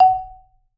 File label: marimba soft F#4, Marimba; Sampleheads - Dave Samuels Marimba & Vibes